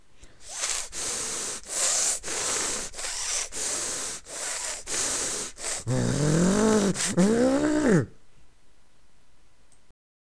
zombie event; damnedforest

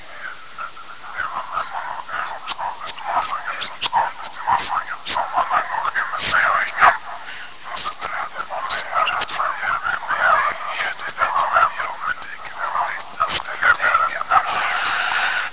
horror; silent hill

